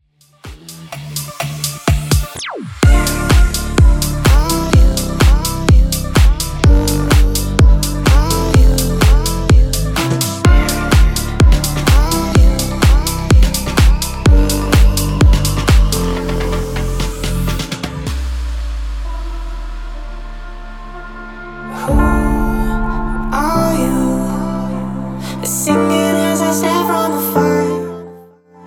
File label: Bootleg House 126bpm